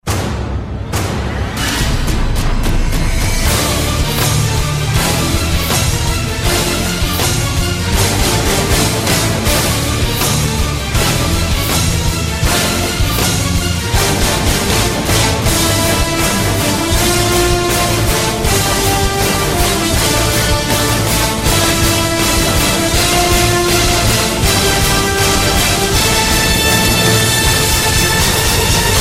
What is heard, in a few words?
Most Epic Ringtone